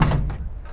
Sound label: Foley lo; layering